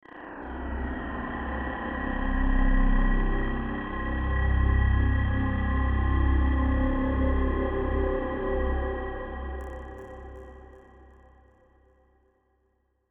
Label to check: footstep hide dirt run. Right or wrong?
Wrong